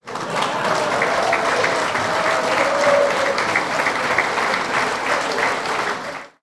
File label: Applause Slow